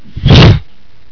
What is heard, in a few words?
fire; windows-nt; XPSP1